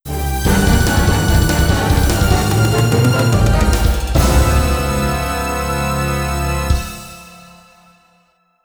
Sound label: Game Start [Arrange]